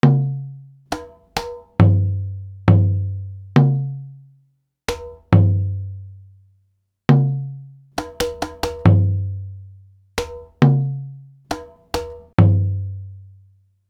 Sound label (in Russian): ritmicheskiy diktant